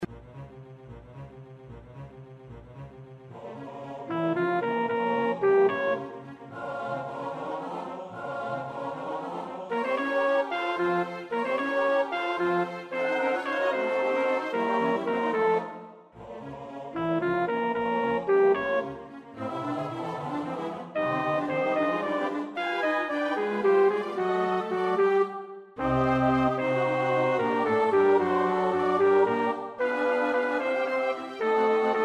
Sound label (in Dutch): bariton; Poulenc (Francis); Stabat Mater